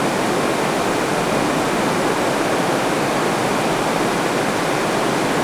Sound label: Amb Weather; Red Orchestra Ostfront 41-45